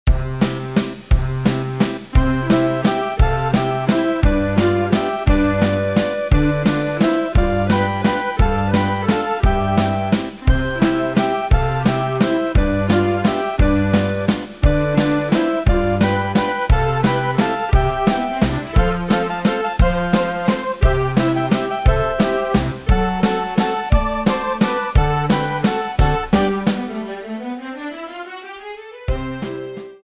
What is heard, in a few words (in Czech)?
Karaoke písnička; MP3 s melodickou linkou